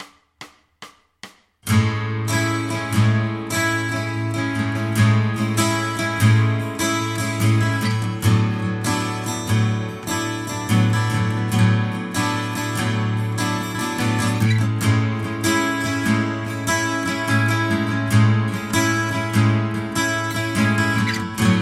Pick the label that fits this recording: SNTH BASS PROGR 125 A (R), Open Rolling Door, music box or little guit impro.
little guit impro